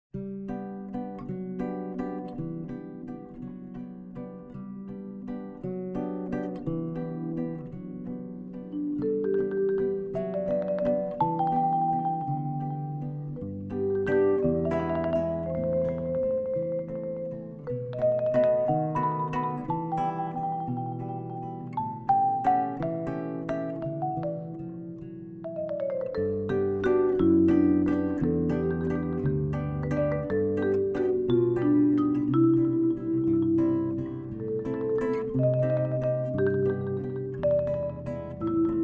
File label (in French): valse